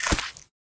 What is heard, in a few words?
splat; soundscrate